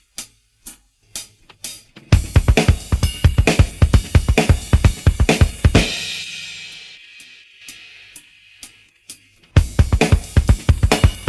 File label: pattern, drums